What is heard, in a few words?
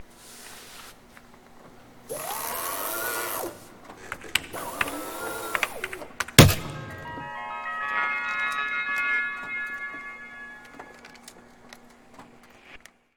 computer jingle